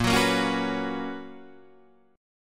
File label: B Major 7th Suspended 2nd, chord